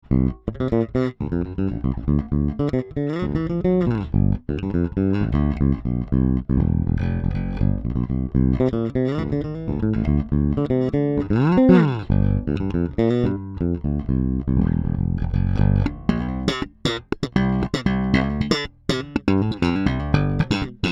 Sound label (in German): Fodera Passiv